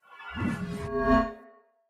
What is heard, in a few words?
Great Sword Accent Heavy; Weapons; Swords; Dendrit Ara Great Sword; Accents